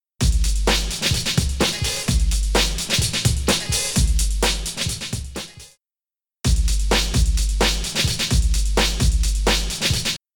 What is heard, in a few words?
Beat